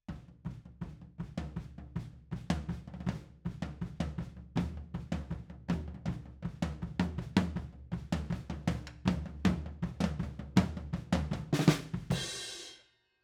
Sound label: Overheads, 160 BPM